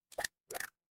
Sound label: water drip